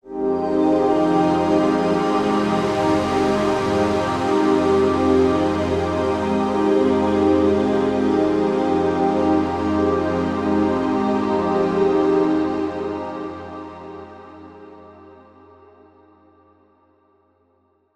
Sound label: pad baths F